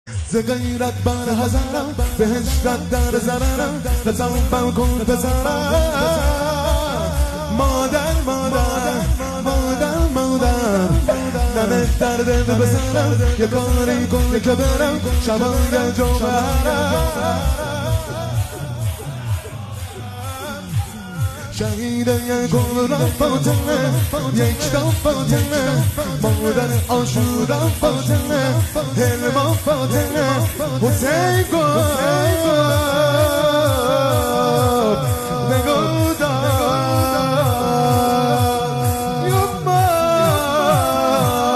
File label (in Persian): مداحی